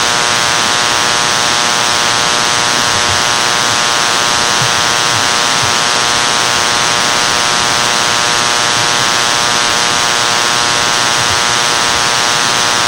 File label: Computer Screen speak thinking